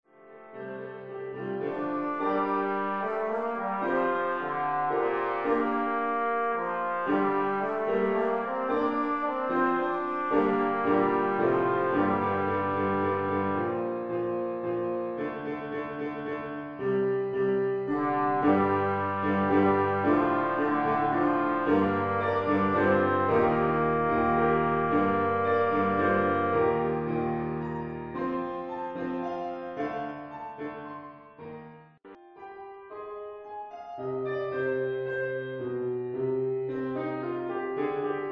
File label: trombone piano; St Patricks Breastplate